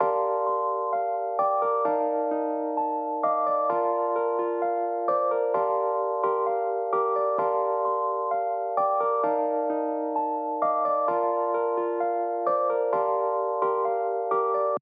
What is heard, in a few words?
COMPRESSED PIANO